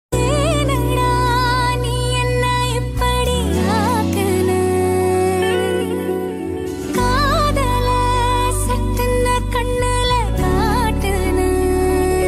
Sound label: tamil ringtone